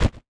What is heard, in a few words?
flick Prepare; HeavenStudio; fork Lifter